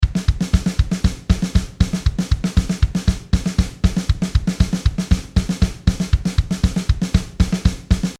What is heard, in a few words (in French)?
Fill Complet 4 fois